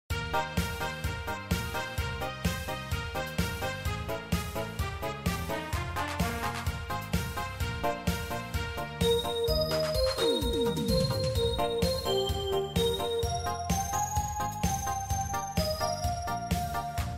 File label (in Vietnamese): nhac beat